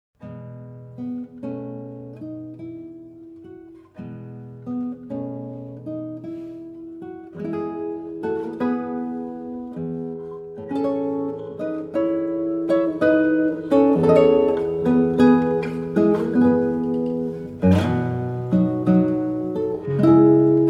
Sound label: Prelude 5 in E major; Tárrega